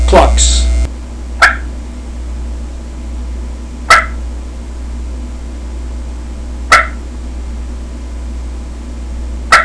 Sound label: boss hen lite clucks